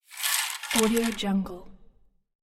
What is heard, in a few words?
household curtain drawn